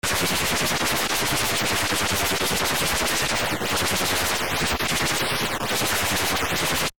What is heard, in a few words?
wubble